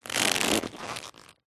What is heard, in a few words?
foley leather baseball glove movement creak